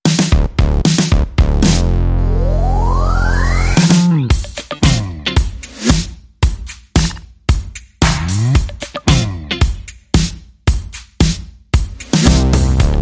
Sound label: No Brass and No Vocal Shouts